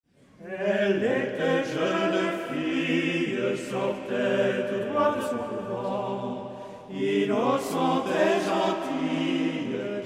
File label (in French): musique